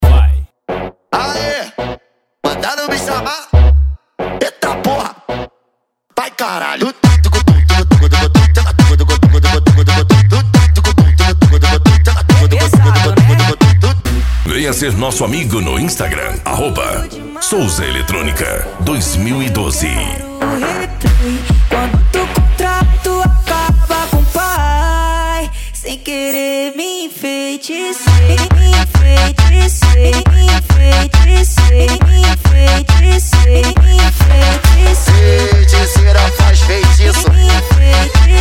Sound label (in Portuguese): edicao automotivo; souza eletronica